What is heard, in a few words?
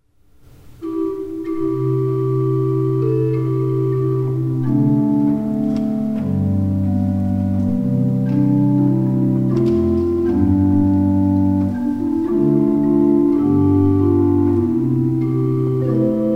improvisation bach, orgel